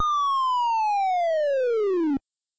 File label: fall; Lode Runner Total Recall; C64